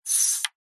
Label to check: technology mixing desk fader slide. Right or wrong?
Right